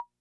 Wood Block, rhythm330; classic machines

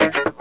WAH SNIP, Funk Gtr & Wah

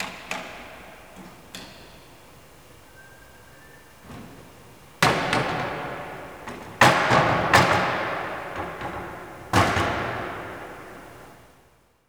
CLOSE ORG., Cathedral Organ; ILIO; Propeller Islands

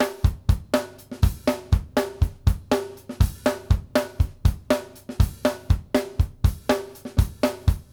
BASIC D R, Drumworks

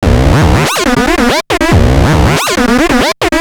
Analoop, Industrial

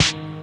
Snare Synth Long; Lost Tapes From Mars

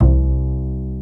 ROCK ORGAN

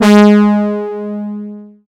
SYN G#3, Analog; Sound & Vision; Gigapack I CD 2

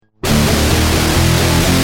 Guitar, Industrial

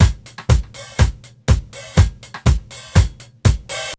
NICE BEAT, Roots Disco; USB Soundscan vol.32; AKAI

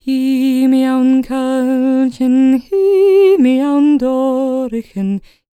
CELTIC, World Voices, GAELIC FEM; ILIO; Vocal Planet

